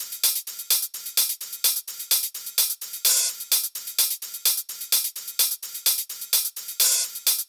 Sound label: 128BPM Latin Kit; Latin E Kit